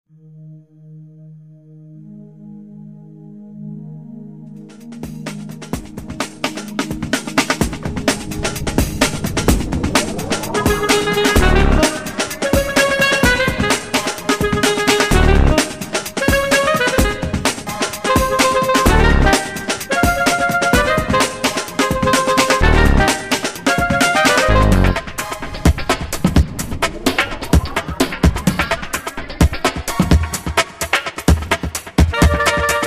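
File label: comedy, playful, medium; comedy rabbits italian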